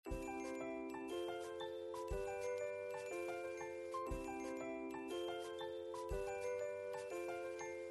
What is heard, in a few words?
music background slow